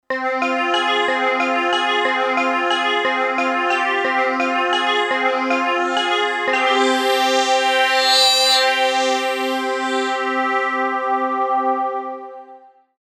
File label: Hydrasynth sequence; Wolfgang Palm